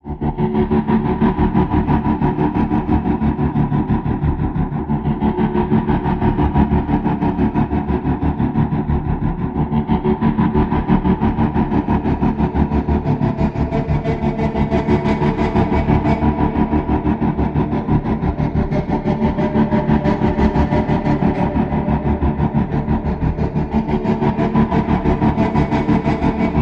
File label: Chant Pulse